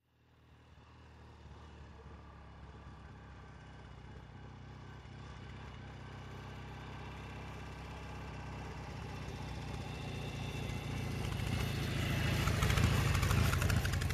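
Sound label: Motorcycle Norton By Very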